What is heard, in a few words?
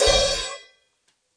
crash choke loud; Crashes